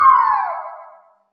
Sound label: distant scream; Horror